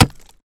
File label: pickaxe hit